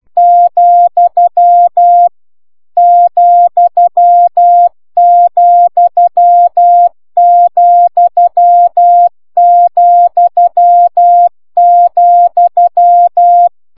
cw vessz

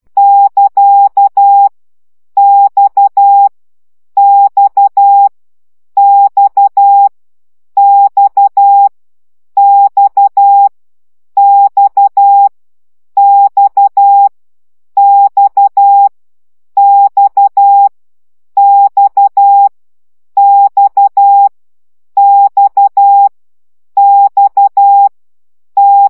CW gyakorlas x 10 baud

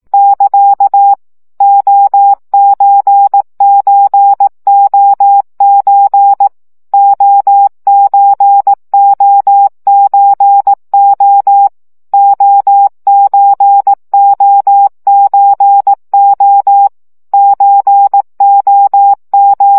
CW gyakorlas o o2 15 baud

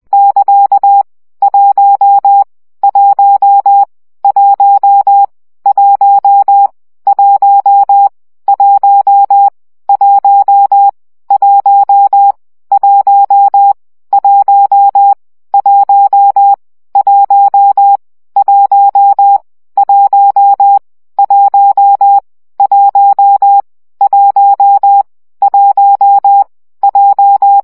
CW gyakorlas 1 17 baud

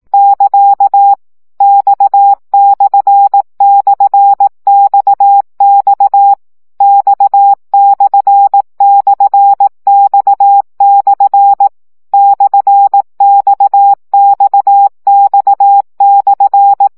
CW gyakorlas x per 15 baud

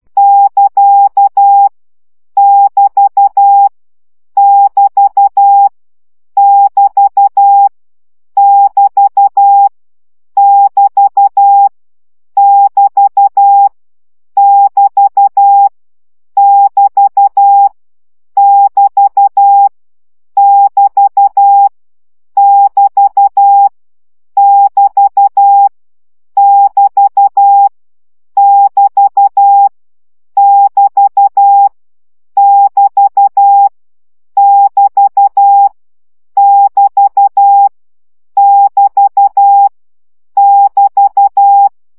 CW gyakorlas egyenlo 10 baud

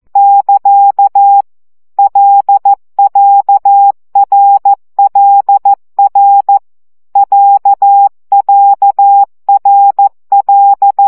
CW gyakorlas r l a2 12 baud

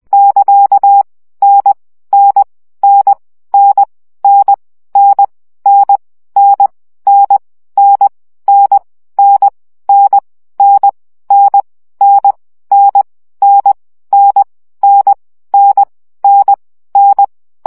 CW gyakorlas n 17 baud

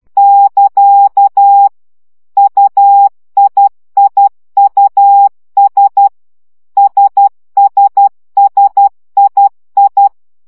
CW gyakorlas i s u 10 baud

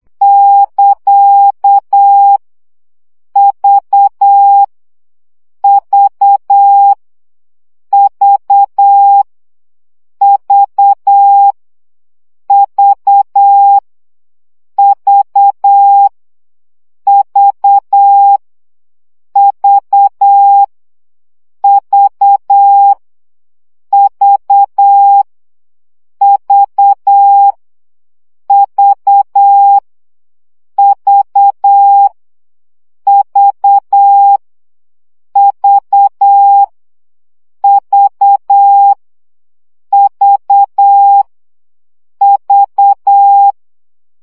CW gyakorlas v 7 baud

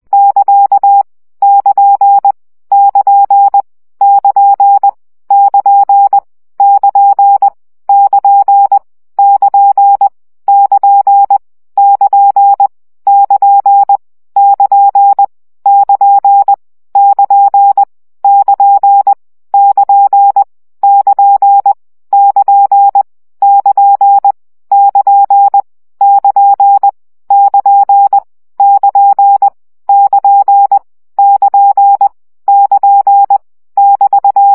CW gyakorlas kn 17 baud

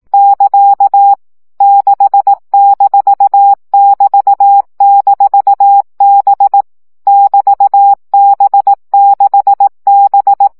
CW gyakorlas b 6 egyenlo minusz 15 baud

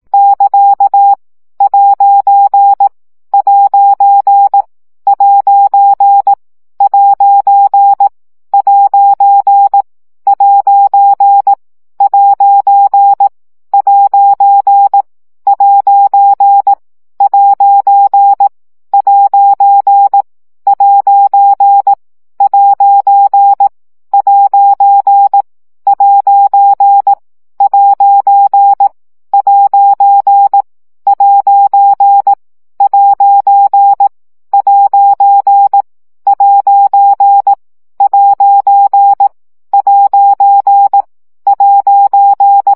CW gyakorlas aposztrof 15 baud

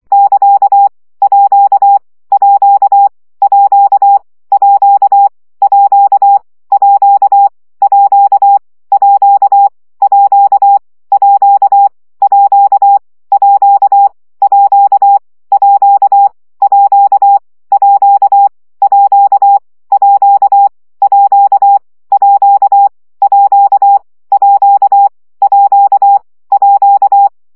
CW gyakorlas a1 20 baud

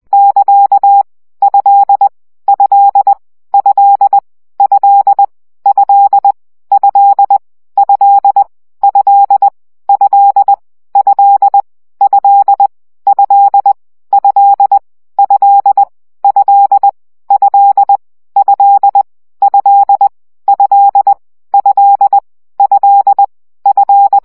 CW gyakorlas e 17 baud

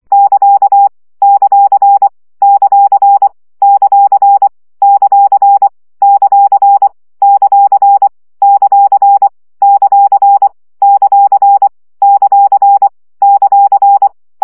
CW gyakorlas pontosvesszo 20 baud

